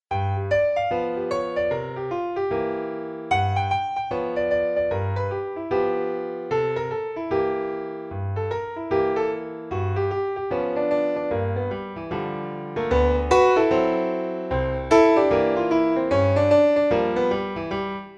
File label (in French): Piano seul